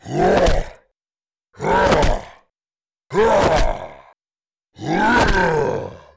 Rhinogar Warrior Male Attacks

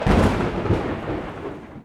Thunder Close

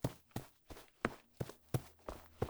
Footstep Fullstep Soil Jogging Plimsole Hard

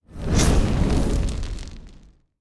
Inferno

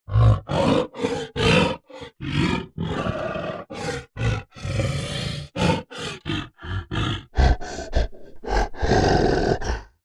Whiteback Gorilla Vocals

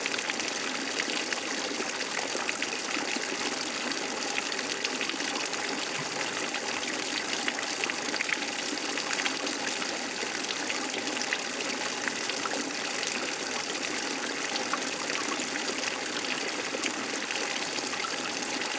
Meltwater Drip intense

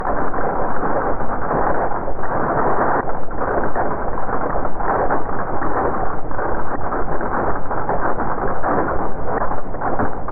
Portal Close

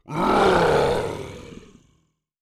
Lionman Unproven Male Growl

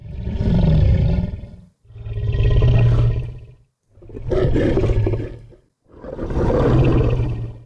Mountain Basilisk Vocals

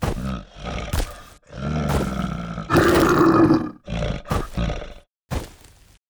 Black Bear

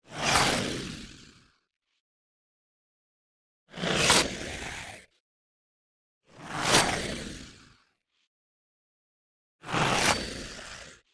Gargoyle Flesh Ripper Attacks

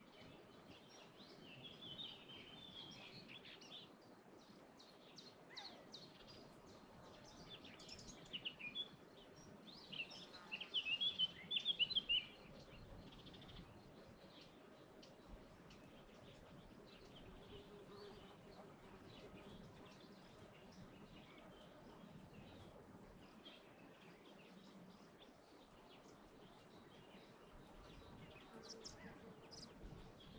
Settlement General Ambience

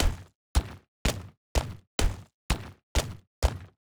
Golem Movement; Golem Slaver

